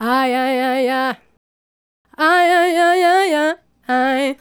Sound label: Female Solo, Hip-Hop and RnB Vocals